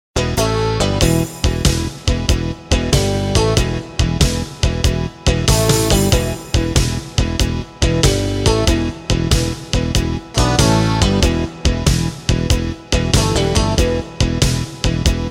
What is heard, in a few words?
Blues